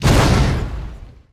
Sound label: Explo Small; SFX Kit; Destruction